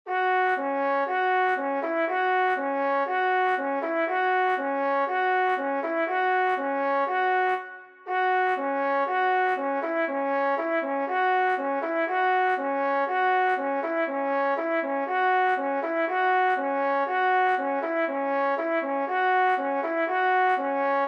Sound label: Trombone